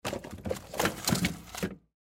Freezer Object Out, Freezer Pull Object Out; Appliance; Kitchen

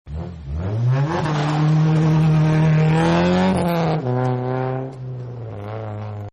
Rally Sport Takes Off, Car; Cars; Vehicles; Partners In Rhyme

